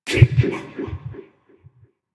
Heartbeat

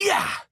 Jump; Jake

